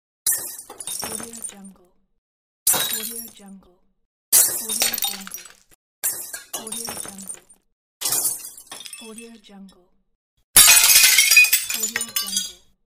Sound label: glass breaks